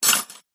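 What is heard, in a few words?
Silverware Drop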